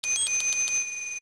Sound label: Alarm; Tael alarming